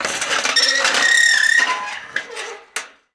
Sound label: Gate Metal Fence Open; SFX Kit; Doors